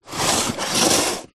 industrial tool box small plastic slide on screed concrete floor